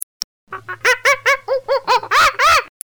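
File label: Orangu coo, cucù con gli animali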